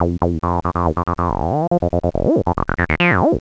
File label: BASSLINE